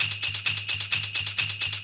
drum 130 hihat, Techno Trance, Drumloops

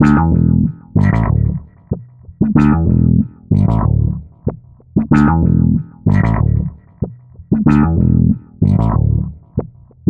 Filtered Funky Bass, Electric Bass; Sonic Foundry (Sony Creative Software); Leo Cavallo Bass Taster

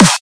drum snare; Virtual Riot; Drum Hits; poggers

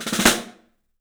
STEEL ROLLS; 3D Drums

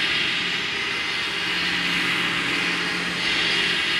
sparse soundscape samples; ReLooped

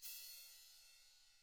BRUSH CYM, Drums; ILIO; Double Platinum Drums 1

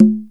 Conga, EMU Proteus

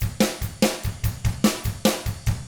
ROCK 6-8, Drums; ILIO; Double Platinum Drums 1

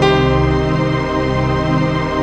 PAD, Dance Mega Synth

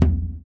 surdo; ILIO; Ethno Techno

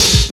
OP HAT; East West; Phat & Phunky

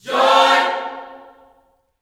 JOY CHORD, Gospel, Vocal; ILIO; Vocal Planet

